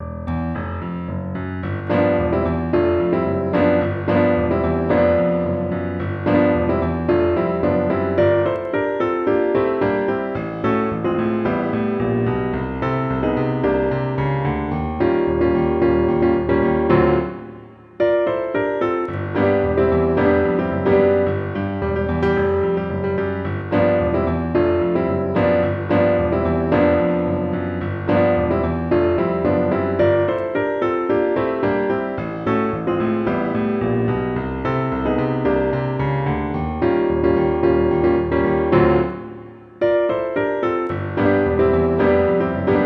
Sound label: R&B-Gospel Elements Exercise; (incl. substitutions); Technical Exercises for Improving Keyboard Technique